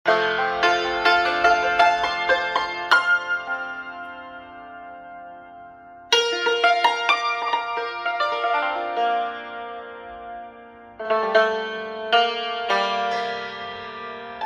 Santour

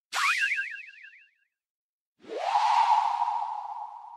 appear

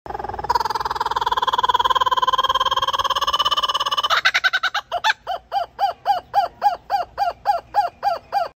Kookaburra

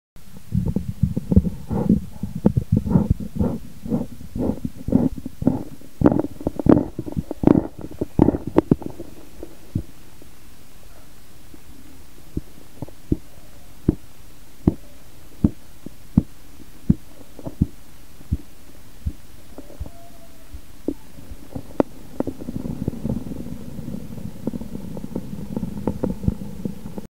manual blood pressure monitor

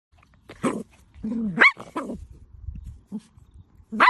Poodle; سگ پودل

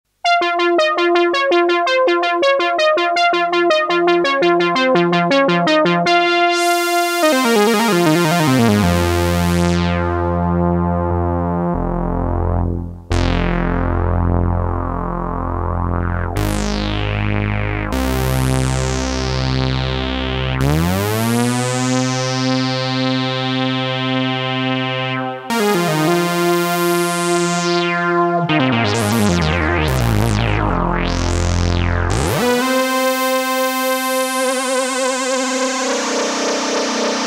Synthesiser

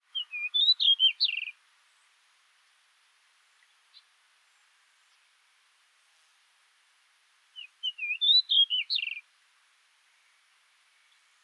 Pied bush chat

